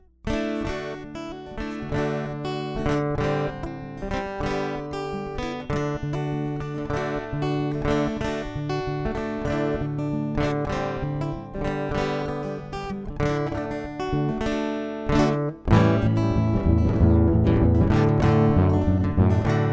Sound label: acoustic; MegaEpix